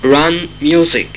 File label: Run Music; Instruments